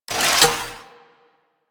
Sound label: Shotgun Fire Stop Mech A; Weapons; Warframe; Tenno; Alchemist